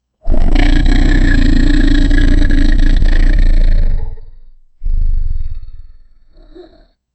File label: a short controlled roar, creature